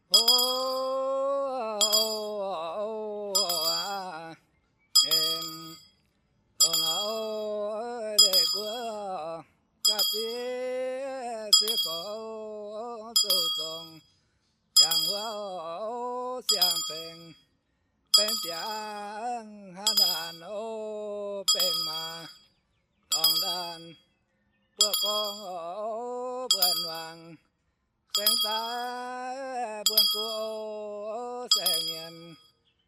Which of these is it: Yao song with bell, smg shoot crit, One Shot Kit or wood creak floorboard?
Yao song with bell